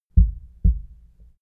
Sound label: heart beat double slow; comedy-cartoon; koerpergeraeusche